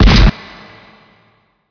shot cycler shoot; PC Gamer IT CD 41; Daikatana

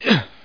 throw; TNPDEMO

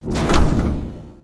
Switch Weapon; Defiance

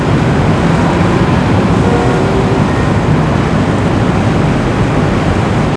city sfx, environment; SINDEMO

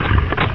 SWITCH; chasm

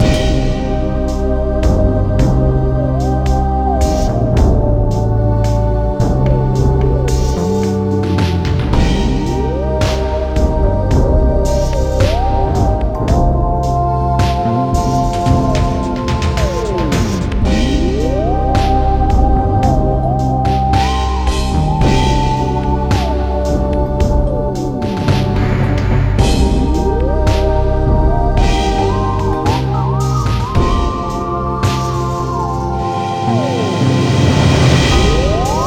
mysterious game level

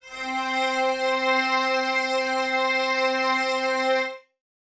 String Ensemble C4; Pro Sounds Sample Collection

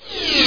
GO DOWN; amaze

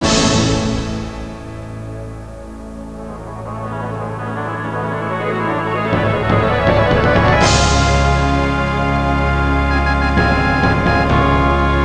Logo music; DR. BRAIN

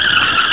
skid loop; Stunt GP

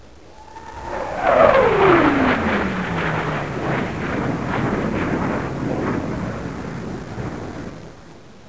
jet

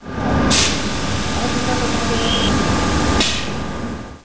amb

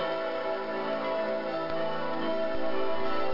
glockloo

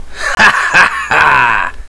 Message

